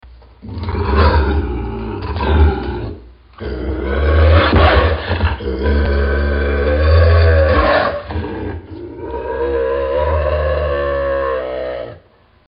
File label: Bobcat Snarling Growl Sounds Car Horn